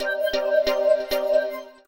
sms; Lenovo; P700i

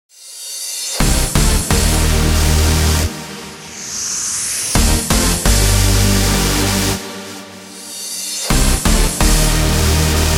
Trance; Lumia 640 China

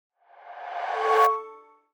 HAPTIC CHANGE, Sharp AQUOS phone ui; AQUOS sense lite SH-M05

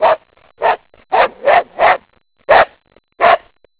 Barking Dog; Innostream INNO 55

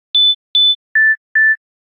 Step Beep; LG; Optimus L5 E610v

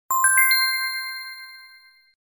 message, notifications; Gionee GN708

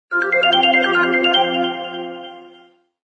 ring in; Samsung SCH-i539; Basic

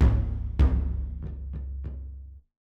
Drum RS; Vivo X3S-W

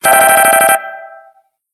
Minimal tone, ringtones; Samsung GT-B7510 Galaxy Pro

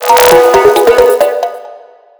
Reaction alert, notifications; Motorola Droid-4

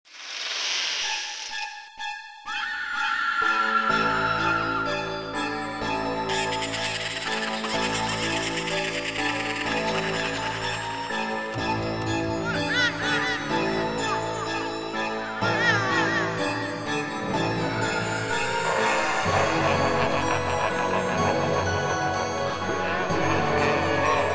Halloween horror night; Arphiola ringtones

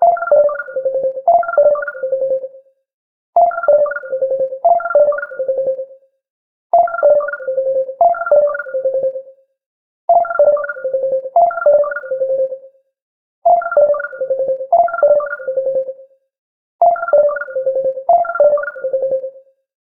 3D Tone, ringtones; SKY IM-A770K-VEGA-Racer

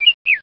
message, notifications; Coolpad; T2-W01

